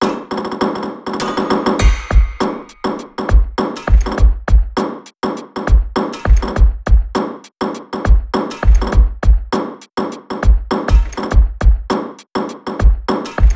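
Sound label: Drum Loop, Music Hall Percussion; 44.1 Khz Stereo Wav File, 16 bit; Aberdeen Music Hall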